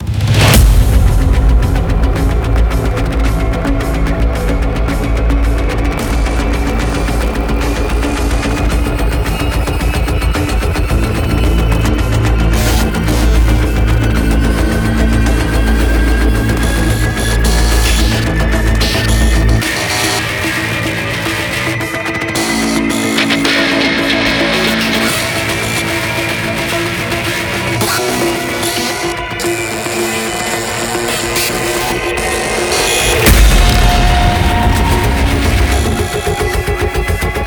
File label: 110bpm Loop